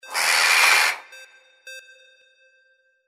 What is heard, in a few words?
CROW